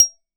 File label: Metalshaker, Household; Convolution; NI Kontakt 5